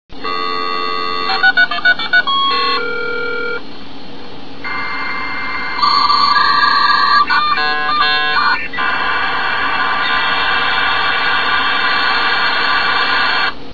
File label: modem